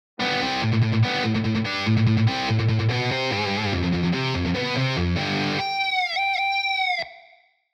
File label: guitar pattern 2 gt